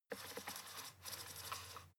fader plastic stutter soft

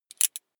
metal belt buckle clatter heavy

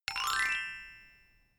notification glockenspiel slide up soft

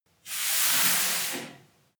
broom sweep slow single

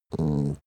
dog small growl very soft short

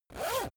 zipper use quick

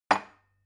glass put down cupboard hard

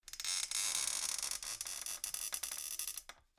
folding chair creak

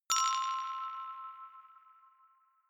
notification glockenspiel rattle third

